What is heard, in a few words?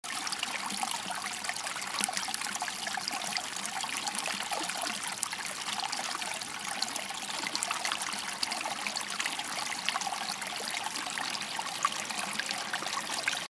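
Potok, stream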